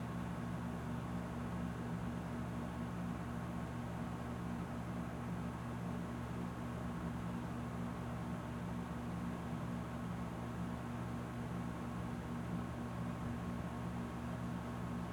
ambience cot, air conditioning running, slight pulsating feel from AC unit as it runs, pressure release; pressure release somewhere in the distance; Minimal ambiences; Omar Alvarado